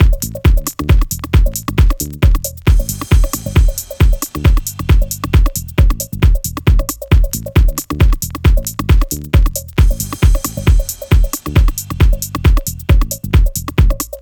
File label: Full Beat